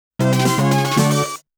Trespassing Fanfare; Namco Classic Collection Vol.2; arcade

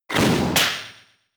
Block

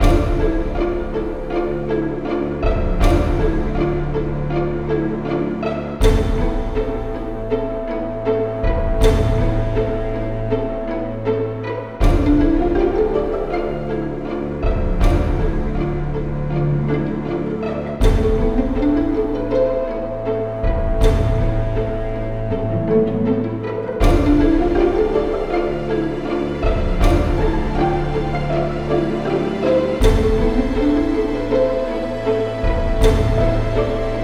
Molto Pizzicato; SpellForce 2: Faith in Destiny

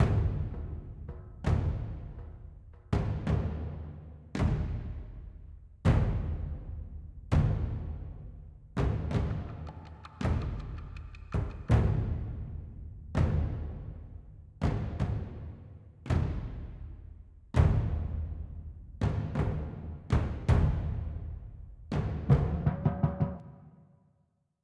Music Battle

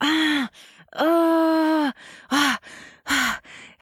Moan REV; Anna

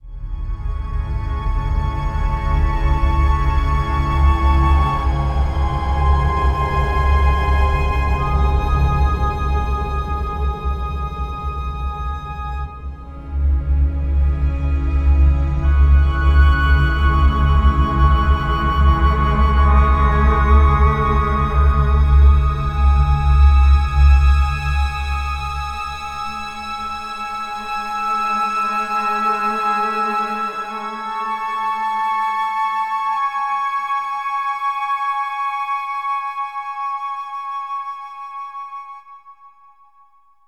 Trumpet Backing; Title Cards Ep01

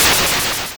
Surfacing (Sound); Gloom

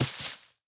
grass drop; devour

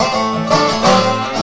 dobro danger

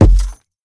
Footsteps Wood Run; Devour; The Town

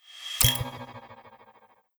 stress up

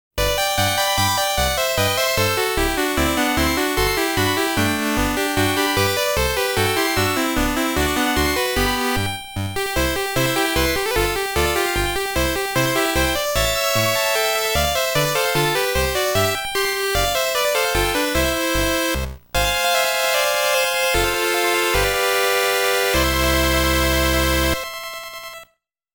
Game Clear; Game Sound Museum Namcot; Mappy; Mappy Land; Mappy Kids

